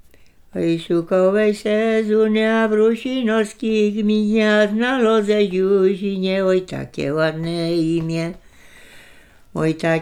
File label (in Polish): przyśpiewki oczepiny